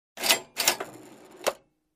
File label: Cash Register Ka-ching sound